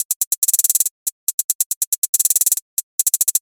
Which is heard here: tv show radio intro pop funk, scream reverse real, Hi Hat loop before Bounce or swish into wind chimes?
Hi Hat loop before Bounce